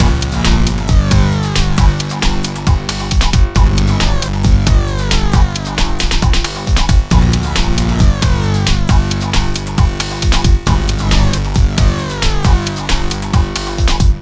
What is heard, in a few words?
Hardcore Breakbeat, 135 Gmin; Big Fish Audio; Hardcore Breakbeat Action